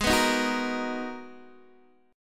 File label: G sharp Minor 9th G sharp, Guitar DADGAD; x,11,9,8,9,8